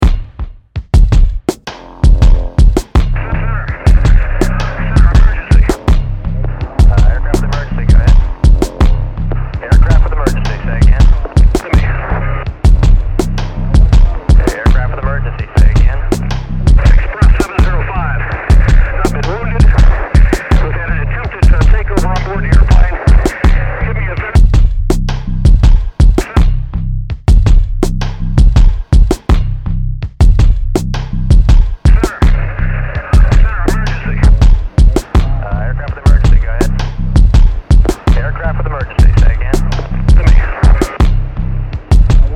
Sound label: aircraft with emergency